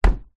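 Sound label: foley footstep jump on wood deck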